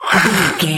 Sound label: zombie voice hiss